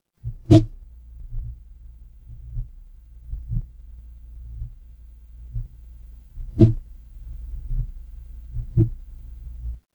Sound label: ambience room tone